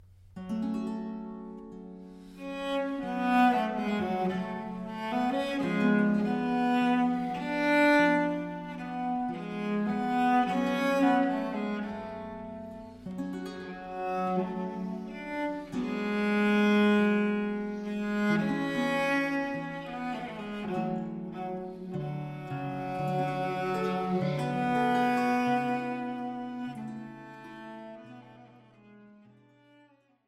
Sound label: Cello Sonata in G Minor Adagio